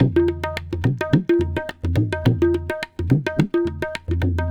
Rahiz, Percussion; Sonic Foundry (Sony Creative Software); World Pop

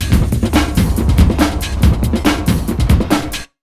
Big Beat Drumloops; RUN 140; USB Soundscan vol.25; AKAI

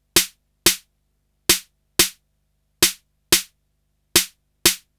Snare Loop

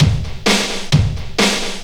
straight, Techno Trance Essentials drum loops

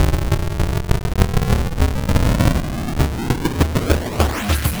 8 Bit Beat, Modular Electronica; Beats; Sonic Foundry (Sony Creative Software)

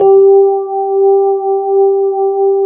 Fender G3; USB Soundscan vol.09; AKAI; Keyboards Old School

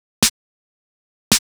Snare, Trance

